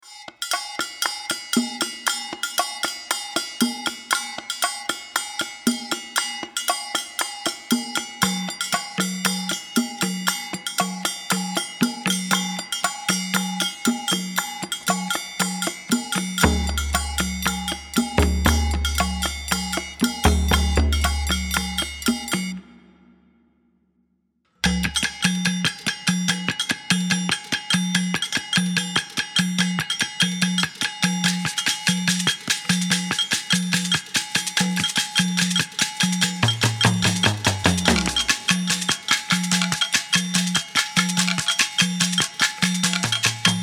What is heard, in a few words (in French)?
Percussions en folie